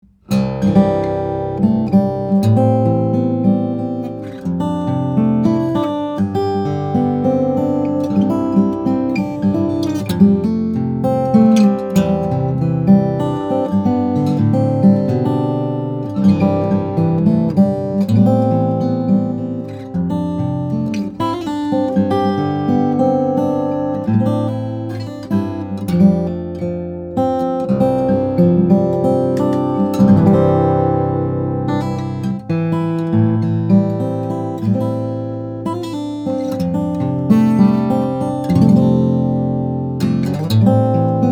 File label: steel string flattop guitar; Applegate SJ; brazilian rosewood; cedar